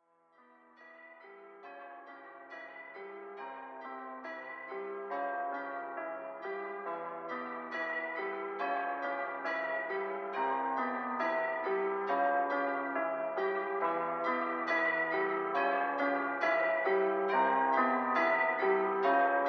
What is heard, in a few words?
Music Layer Only